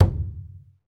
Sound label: Skin Drums